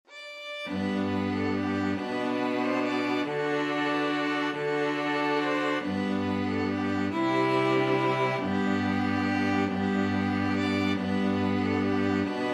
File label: string quartet